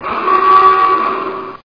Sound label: aoogah; aquaticus